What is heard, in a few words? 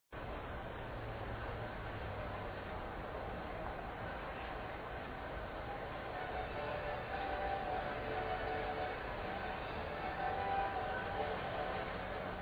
ambience location